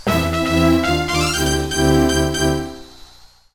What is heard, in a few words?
Versus Win; Star Fox Command